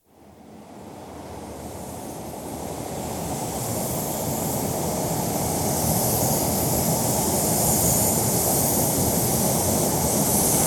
Inter City 125, exterior, arrives at station, atmosphere as train is boarded, train departs; BBC